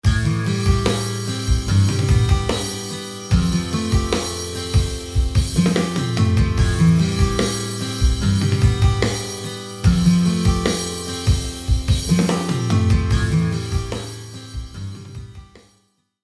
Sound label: Am7 G Fadd9